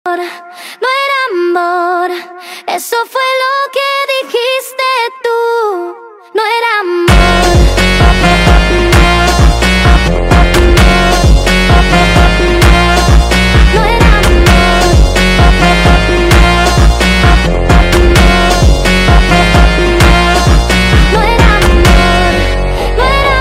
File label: phonk